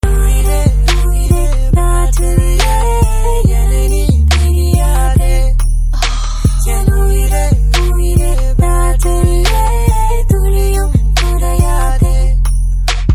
bg tone; tamil; enthiran 2, 2.0

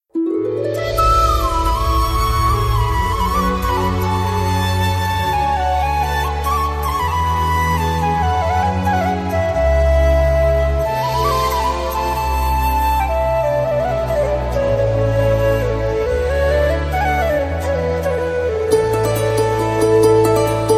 bgm ringtone

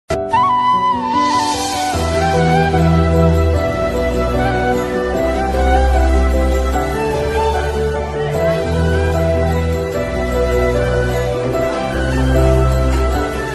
bgm mix ringtone

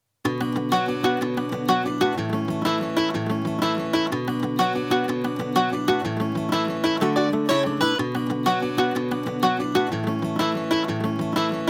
bgm, telugu ringtones

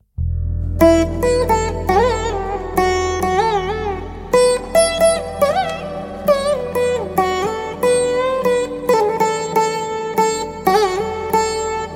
bgm, telugu ringtones

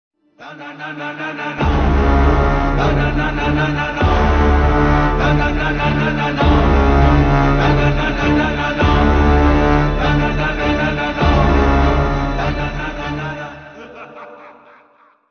mass bgm ringtone

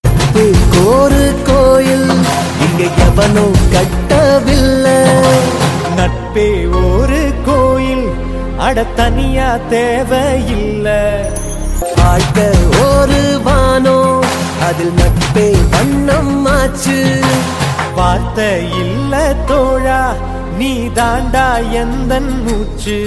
ringtone

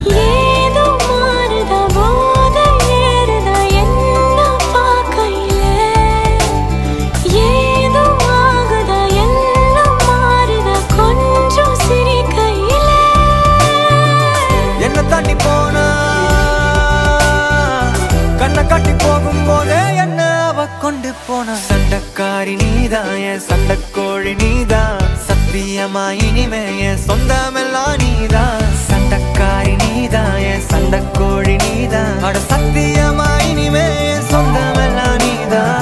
female love ringtone, tamil ringtones; sanga thamizhan

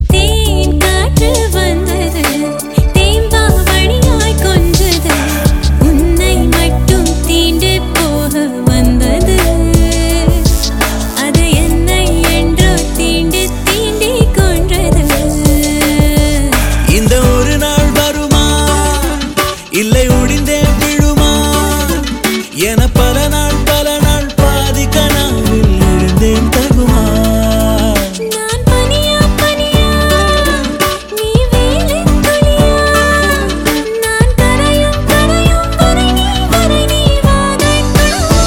female

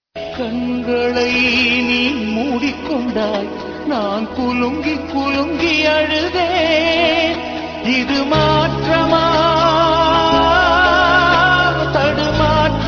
song ringtone

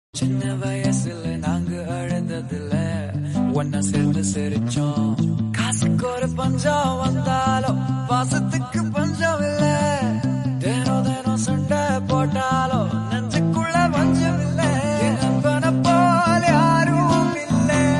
friendship tamil cut songs

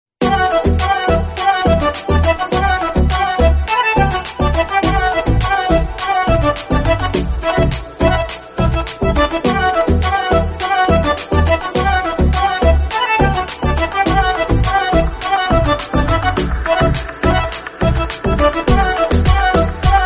song ringtone; hindi ringtones

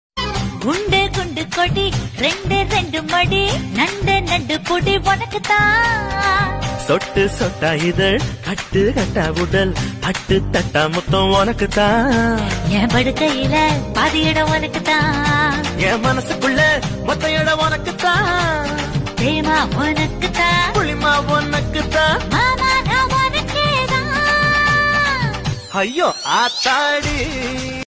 tamil beat music ringtone

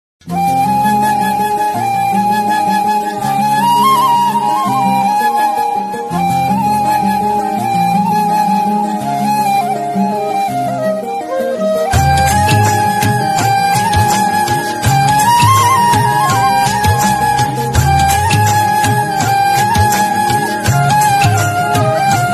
beat bgm ringtones

